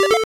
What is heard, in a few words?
teleport